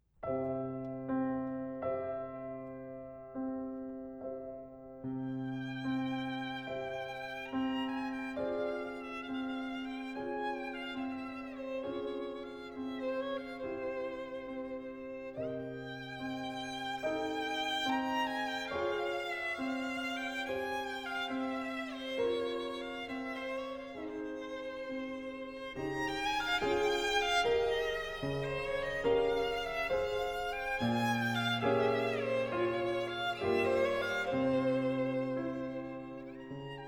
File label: Romanian Folk Dances, Hornpipe Dance, Molto moderato